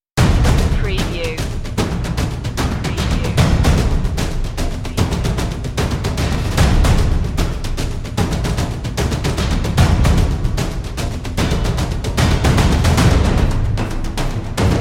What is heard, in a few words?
dramatic percussion